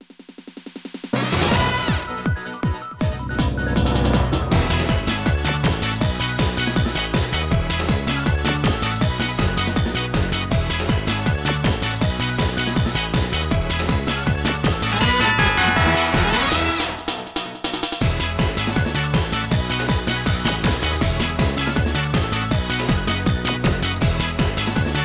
Multiplayer Match Victory; bomberman generation

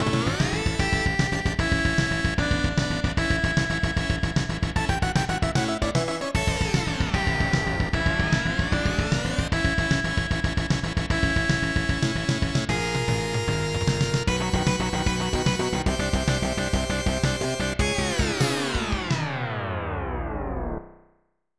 Victory; thunder spirits; snes

